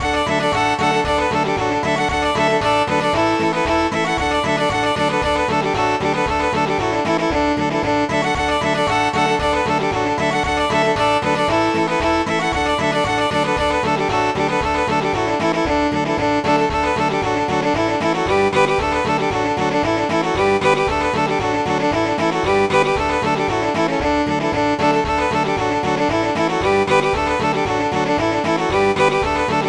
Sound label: Schottische, midis